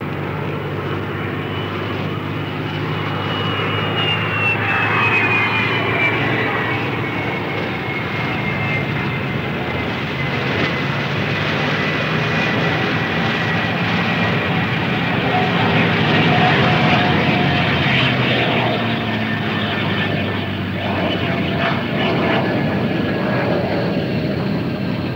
B-29 Bomber Multi By, Possible Airport Background; b-29 superfortress

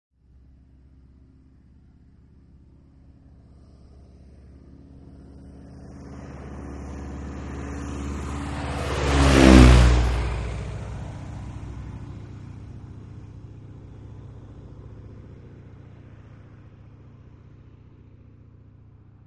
Stearman By Medium

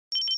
Computer Beep High O, beeps

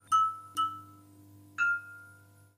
Neon Light Switching On; power on off

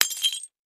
Tile Sml Square Break

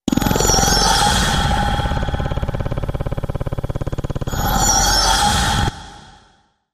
Breath Flutter Whoosh

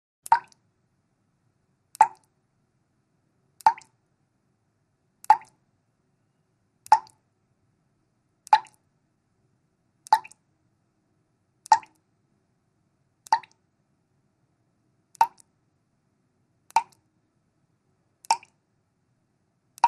Water Drips Slw Drip

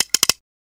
Gun Chamber Spin Slo

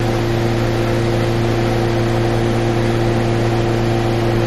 Generating Station, generator

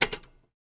Typewriter Bad Key; typewriters

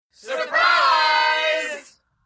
Surprise Grp Yelli, party

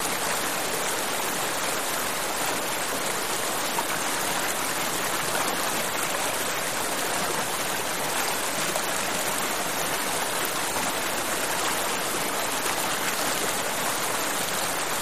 River Flow With Light Rapids Over Rocks, Choppy

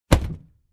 Foot Locker Dropped, boxes crates

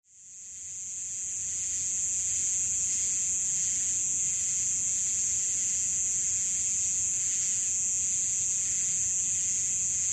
Forest Amb, crickets

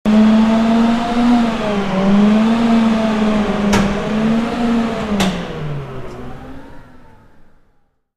Engine Heavy Hum, factory

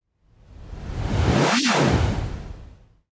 SPACESHIP FLYBY

